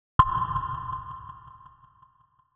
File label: Tightness